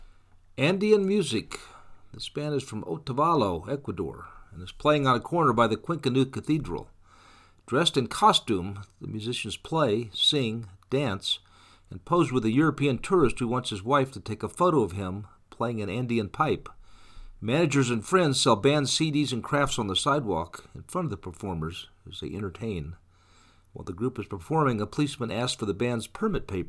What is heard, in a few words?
andean music; Cuenca